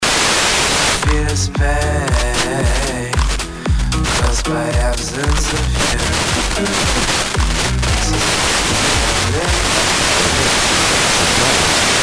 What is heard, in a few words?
slovakia